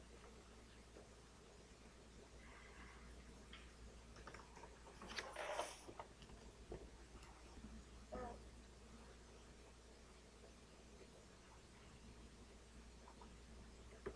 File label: spoon